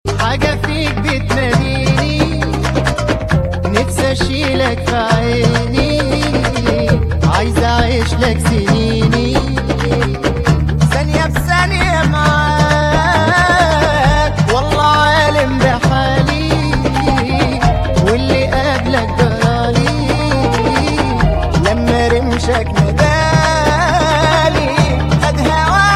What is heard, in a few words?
Arabic